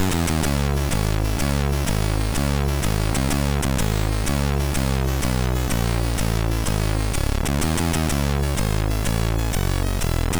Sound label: sid MOS6581R3